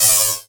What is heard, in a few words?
SOUND EFFECT, Metal; AKAI S6000 CD-ROM - Volume 1